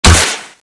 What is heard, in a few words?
bow charge shoot empty